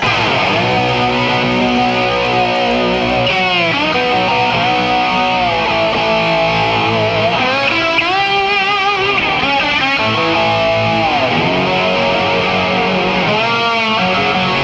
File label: guitar solo